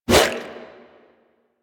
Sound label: Sword Swipe, Whooshes; Warframe; Weapons; Melee; Pacifist Sword And Board